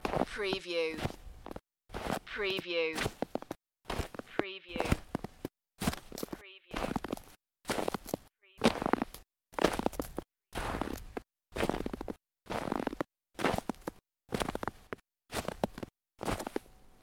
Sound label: Snow and Ice, Footsteps snow